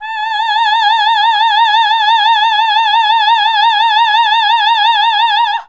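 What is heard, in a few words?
Classical Female A4, choir; Best Service Gigapack 1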